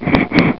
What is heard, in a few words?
ROCK; CATACOMB